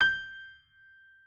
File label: piano